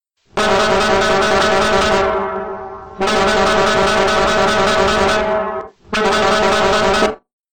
autobus italien trompe acier; klaxon

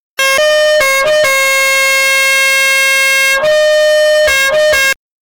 son la micheline, klaxon

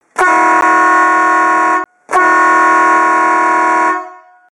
son le double camion bateau, klaxon

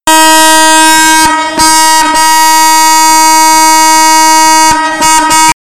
son klaxon industriel; camion; mdk 405 gris et noir

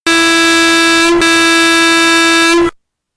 son camion bateau moto, klaxon

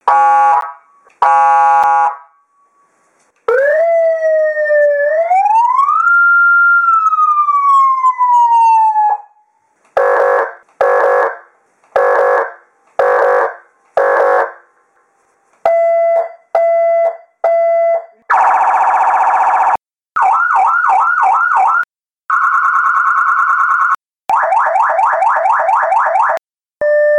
son sirene electronique 12 sons; sirene electronique gros modele; mdk

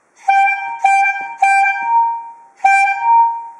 son klaxon poire droit petit; mdkh 03

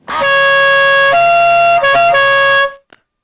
son train corail

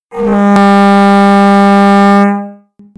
son trompe acier 64 cm pavillon rectangle

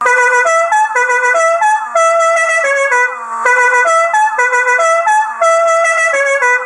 son la cucaracha trompe, klaxon

